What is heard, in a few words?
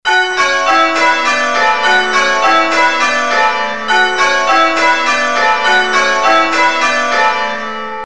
Tittums, changeringing